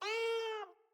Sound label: Kitten Meow Agitated; Enemies; Catbrow